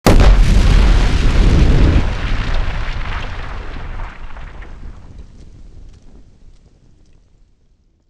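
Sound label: explosion close kaboom; corporate; Titanfall 2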